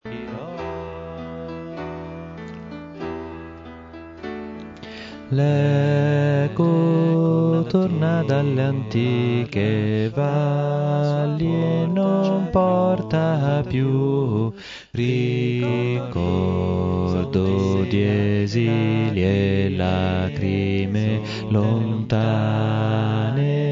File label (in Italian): bassi; ora e tempo di gioia